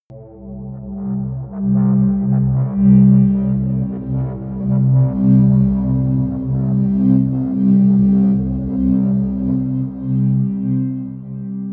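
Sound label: atmospheric feedback pad low, drones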